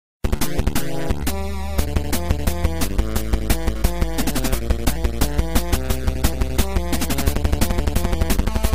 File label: 8 Bit